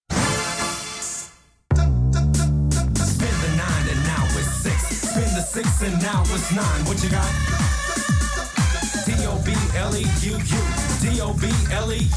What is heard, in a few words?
Jingle